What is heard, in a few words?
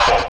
Zappy Fx; Sonic Foundry (Sony Creative Software); Esoterik Beatz